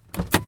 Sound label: enter; outfly